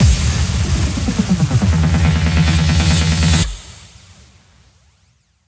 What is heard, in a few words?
140BPM 2Bars, HandsUp & Trance Fills; Fix; Vengeance Samples